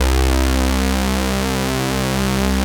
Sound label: POLY SYNTH; Wizoo - Powered Wave